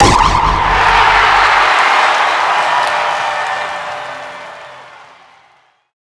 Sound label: impact stun range; Garry's Mod; tf2